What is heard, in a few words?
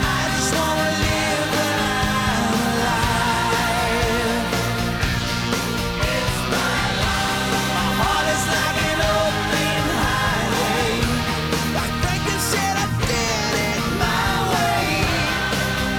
Loop